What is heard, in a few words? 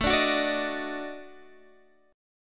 D sharp Minor Major 7th Flat 5th, Guitar